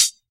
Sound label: dampened rap foot hi hat sound D key; hat samples; 90s